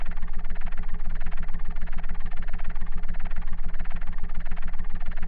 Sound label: BumbleBee flying; DronePack